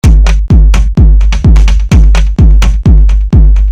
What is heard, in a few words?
Loop